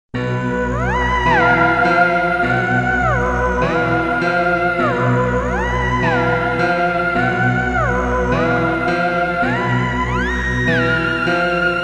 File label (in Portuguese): som de suspense invasores